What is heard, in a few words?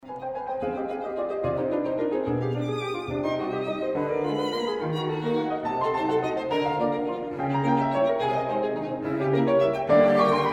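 piano quartet; jadassohn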